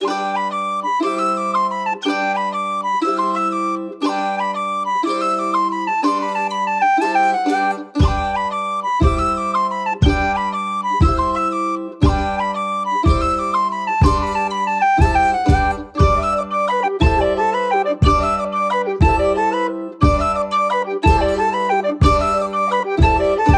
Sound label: Irish dance